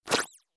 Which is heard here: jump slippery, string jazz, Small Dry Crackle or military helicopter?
jump slippery